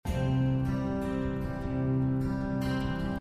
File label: Picking C 78